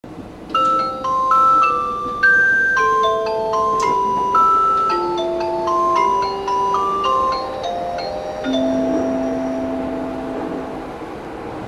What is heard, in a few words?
chime